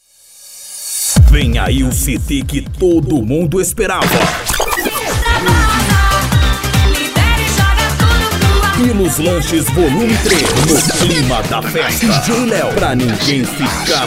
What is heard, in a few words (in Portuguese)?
electro funk e electro dance remix